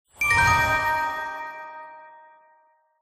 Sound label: lose; 2048